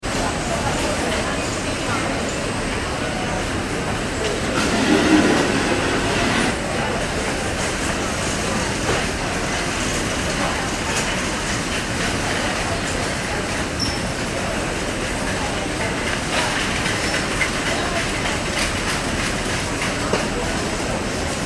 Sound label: ambience airport heathrow baggage reclaim; Airport Ambience - Baggage Reclaim - London Heathrow Airport; Airports; Backgrounds; McKinney Sound